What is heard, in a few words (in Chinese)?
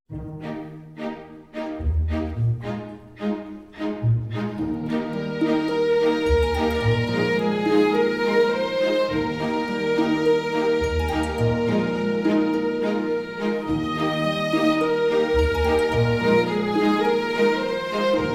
专题片背景音乐